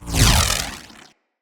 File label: Voltaic Sword Projectile Launch; Characters; Enemy; Abilities; Sinecure; Banneret